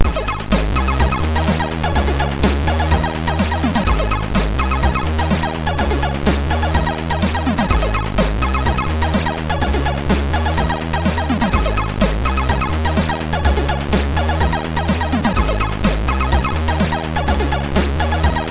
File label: Beach plus Bass, MOS6581; sid; MOS6581R3